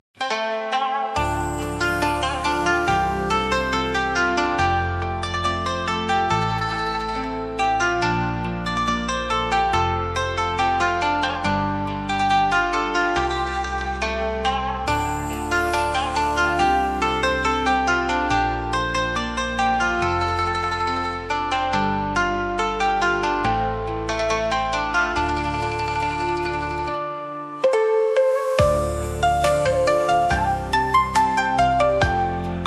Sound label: Asian Music